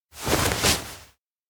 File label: stop; kurumi; anemoi